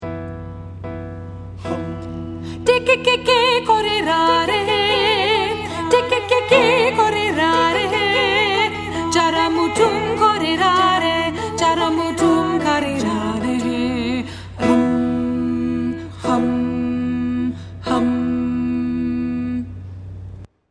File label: Alto; middle school